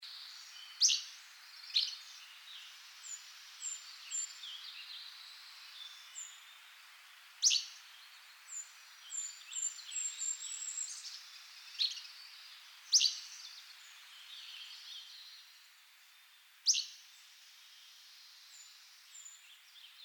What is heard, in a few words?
Hammonds Flycatcher